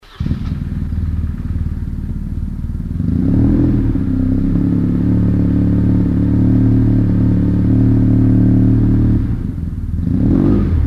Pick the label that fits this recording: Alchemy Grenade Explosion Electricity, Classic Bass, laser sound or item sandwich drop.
laser sound